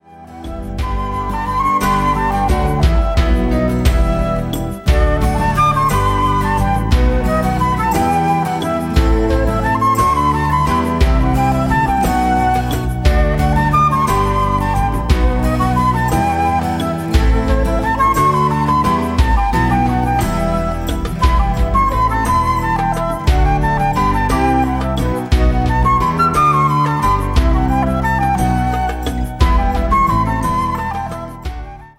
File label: Tourdion (instrumental)